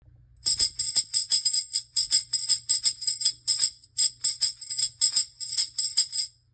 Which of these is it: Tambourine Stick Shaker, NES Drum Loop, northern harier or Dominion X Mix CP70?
Tambourine Stick Shaker